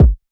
kick trappy

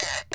Percs

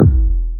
Kick

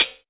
HiHat, closed hats

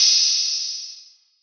OPEN HAT

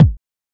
kicks

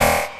Percs

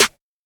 snare quick; Snares

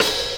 Op, Open Hats

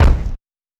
kick one shot low; Kicks

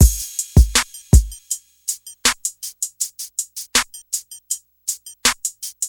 Loop

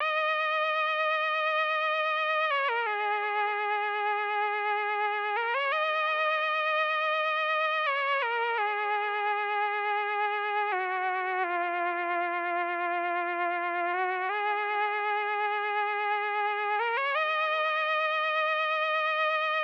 Synths

